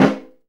SNR HI RIM, VINTAGE FUNK; Zero-G; Funky Elements

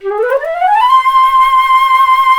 FLT FLT FX, C Flute FX, C Flt Gliss; Roland LCDP04 Orchestral Winds; 90 s Sample CDs

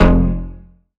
Bass F, Bass Synth

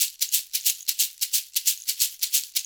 90 PERC; Best Service Advanced Media Trax Modern Composer

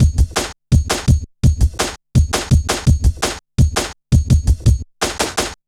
Drum Loops, New Wave 167.5; Zero-G Jungle Warfare 1, 2 & 3; 90 s Sample CDs

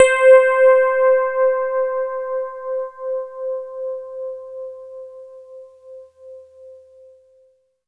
RHODES; East West; Phat & Phunky

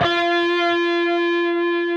PRS F#, Guitars, LEAD; Spectrasonics; Hans Zimmer Guitars VOL-1

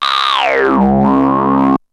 ANALOG, SFX; Syntec; Wall of Sounds; TECHNO-BOX

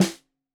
Snare 65 Reg Stereo, Deeply Sampled Drums

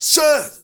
male solo, male noise; Heart of Africa; Spectrasonics; Heart of Africa 1

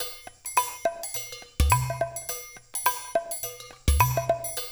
percussion loop

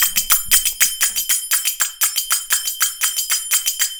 120 CYMB, Indian Traditions; USB Soundscan vol.45; AKAI

